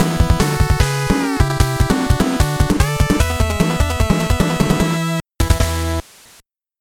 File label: victory; aqua chronicle